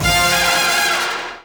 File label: HIT BIG; AMG - Now CD-ROM (Roland); Art of Samplng